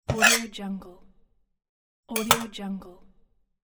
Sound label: screwdriver pickup and place